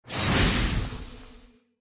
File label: longsword hit